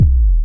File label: low blub, kicks; single hits